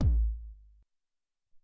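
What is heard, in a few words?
Long Kick 4, Alesis SR-18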